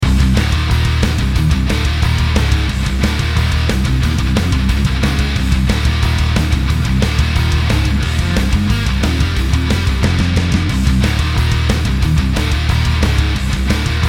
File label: Metal Mastering Limit WET